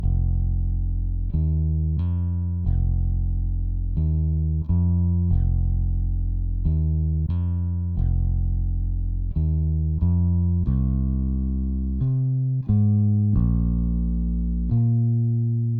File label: Bass; Toki Tori 2